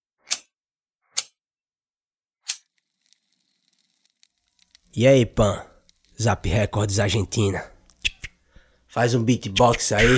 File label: beatbox